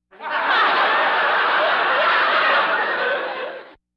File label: Laugh tracks